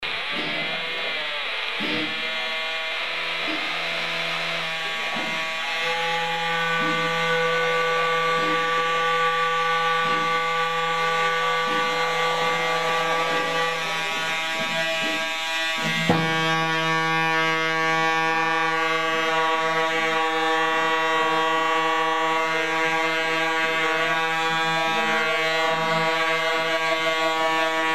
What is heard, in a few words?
tibetan oscillator